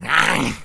zombie attack

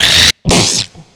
spitter spit; zombie plague

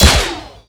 crit hit

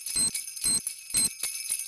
c4 xmas beep

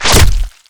zombie pain; basebuilder

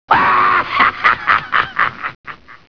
hahaha

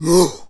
zombie attack

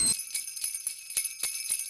c4 xmas beep

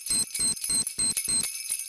c4 beep

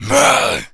zombie carrier attack

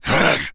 zombie carrier attack

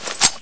knife deploy; weapons

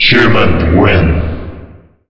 end round win humans; zombie plague

